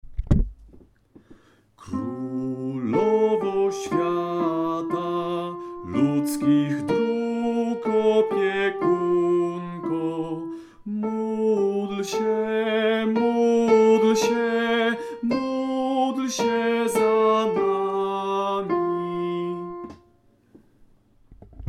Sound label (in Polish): sopran; Krolowo